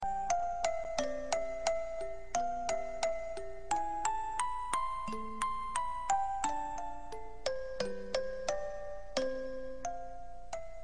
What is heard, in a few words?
Music Box